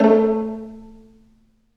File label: Vlns Pizz; Roland L-CD702; Vls Pizz